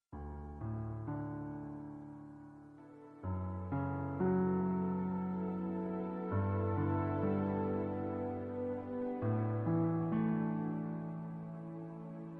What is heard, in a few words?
Instrumental music